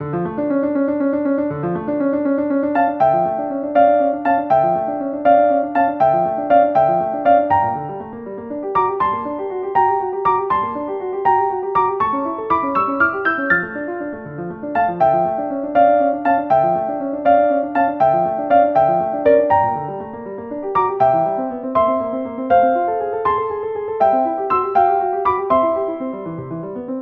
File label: Spinning song; synth